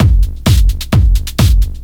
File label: techno, DRUMLOOPS; Techno Trance; Ueberschall; Techno Trance Essentials